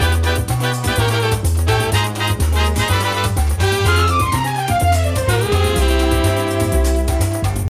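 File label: food world music paradise fight no guitar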